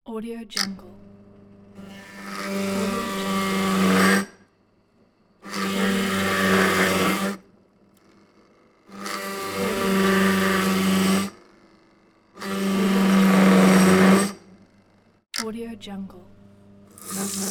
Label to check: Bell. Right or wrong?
Wrong